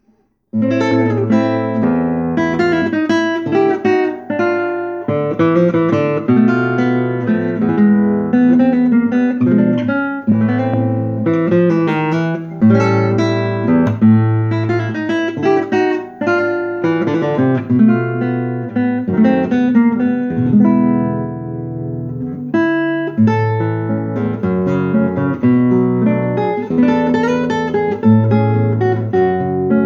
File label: Guitarist; Tucson